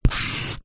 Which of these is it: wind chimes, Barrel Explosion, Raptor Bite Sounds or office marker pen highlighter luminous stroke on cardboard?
office marker pen highlighter luminous stroke on cardboard